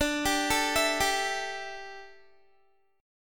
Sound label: D Suspended 2nd Suspended 4th D down Guitar